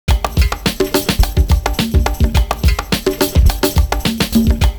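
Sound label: CONGALOOP, 106 bpm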